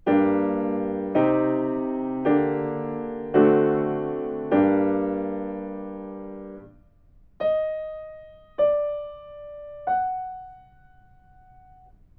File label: melodic fragments; ear training